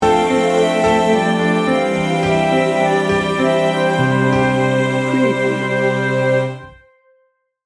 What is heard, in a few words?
atmospheric enchantment sting bumper